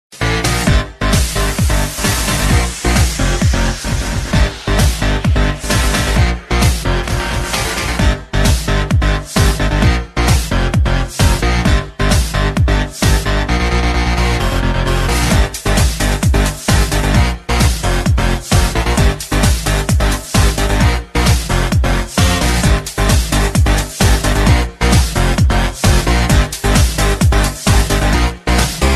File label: electro minimal